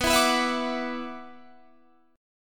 Gb 7th Suspended 4th Sharp 5th B

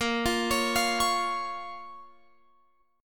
Bb Minor Bb down Guitar Open F; Bbm arpeggiated

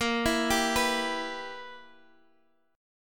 E-Minor-Bb; Em/Bb; arpeggiated

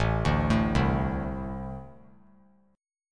A-Minor Double Flat 5th A; arpeggiated; JGuitar

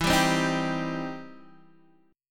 E Minor Major 7th Sharp 5th, Guitar, Open D; down

